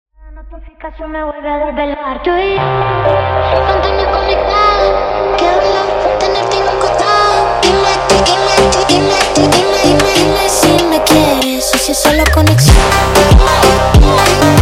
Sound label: Phonk